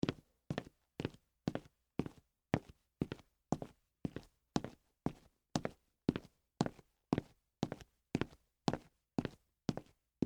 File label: Dress Shoes Walk, Concrete; Footsteps